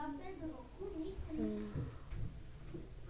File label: stab